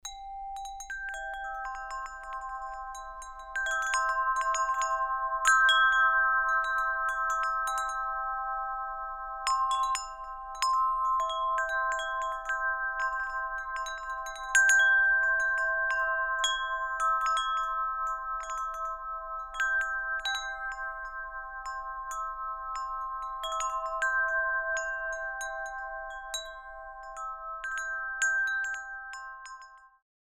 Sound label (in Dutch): Windgong Latin Trio Salsa 29cm; zilver; Mooie klanken komen uit deze windgong met zilveren kleu; Woodstock Chimes